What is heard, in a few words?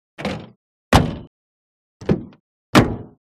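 Door 1929 Chevrolet, antiques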